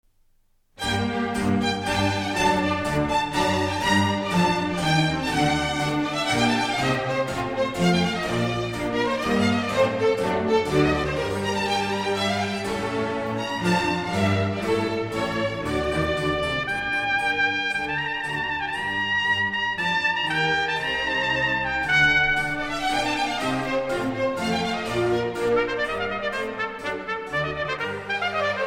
Concerto for Trumpet n.10, IV, Trumpet; Georg Friedrich Haendel

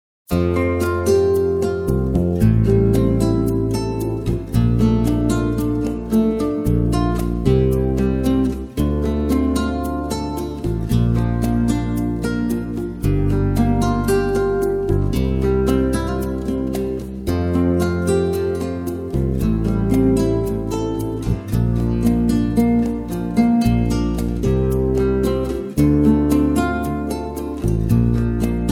Guitar; Newage

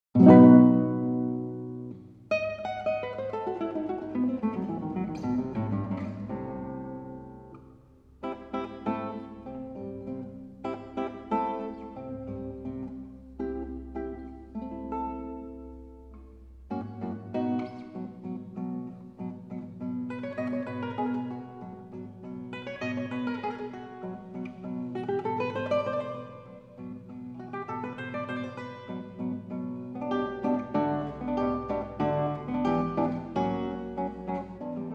Guitar, Danza mora

